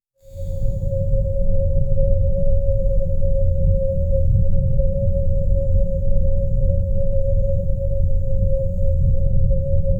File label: soft rotating aura warm; radiant